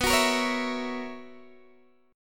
B Major 7th Suspended 2nd Suspended 4th B down Guitar DADGAD